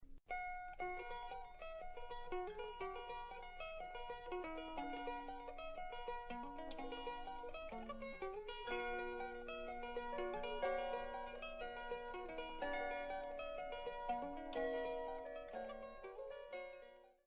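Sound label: scotland background music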